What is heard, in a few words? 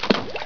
Splash; Worms 2